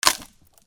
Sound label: BREAKING